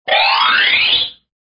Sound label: Xylophon, cartoons